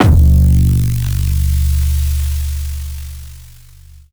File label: kick broken sub; Polyend; Recue